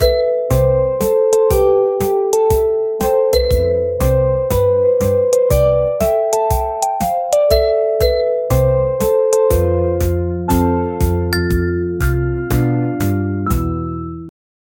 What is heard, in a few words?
create a warm uplifting a; nostalgia